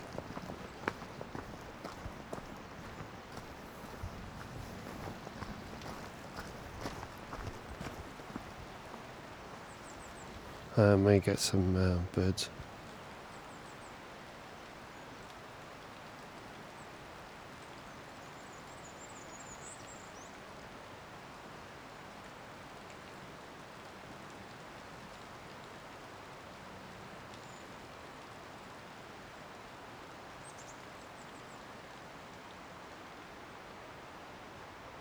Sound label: woodland birds